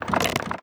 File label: crab